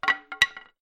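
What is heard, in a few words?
spear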